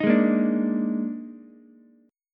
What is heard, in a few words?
Eb Major 7th Suspended 4th, Ukulele; EbM7sus4 strummed; JGuitar